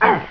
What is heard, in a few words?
pain grunt